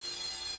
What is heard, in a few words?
Science beep, Windows 98; Windows Sounds